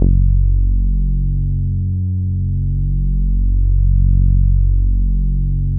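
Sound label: bass F#2, analog bass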